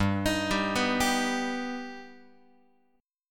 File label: G Augmented G Guitar; G+ arpeggiated; x,3,6,5,3,6; JGuitar